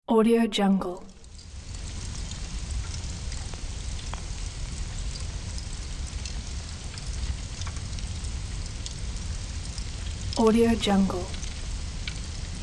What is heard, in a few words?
fire